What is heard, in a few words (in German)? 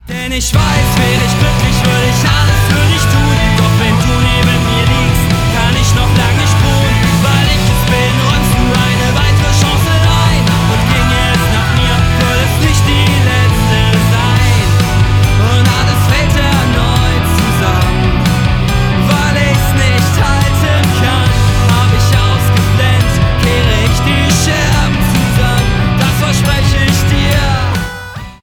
Traurig